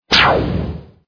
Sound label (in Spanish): rafaga